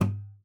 STOP, GUITAR FX; Xsample VOL-2

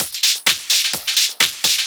Discohouse Loop 128 BPM

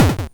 LSDJ Kick Square A, Nintendo Gameboy

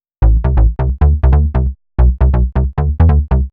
Bass 136A, uk garage 136bpm

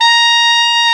TENOR, Tenor Sax; Tenor Sax 1

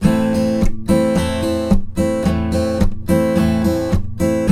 Blues Guitar Samples, RIFF; ILIO; Fretworks

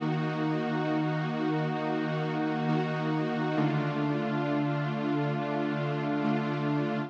String Riff Eb-D-G, 135bpm; Zero-G Sound Sense Grime Scene; GS Construction Kits; The Hoodrat; 90 s Sample CDs

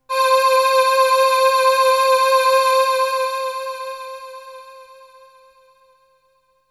Melody oneshot; ZERO G - Datafile DISC 2

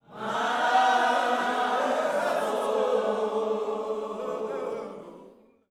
MY SOUL, Vocal Planet Gospel; Spectrasonics; GOSP PHR

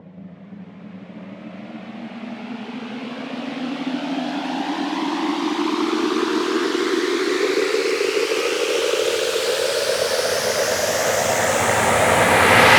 fx riser 150 stratosphere; Virtual Riot; 8bar

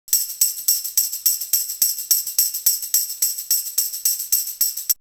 Percussion; AMG - Coldcut's Kleptomania VOL-1

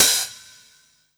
HH, Dance Mega Drums; Best Service; HH HOU

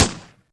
Gun; Yamaha DTXpress; Effect 1

